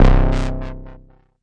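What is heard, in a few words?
mbrute synth, Arturia MiniBrute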